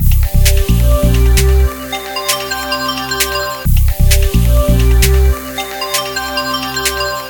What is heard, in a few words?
music weather change; Sony Ericsson; A8i; cmcc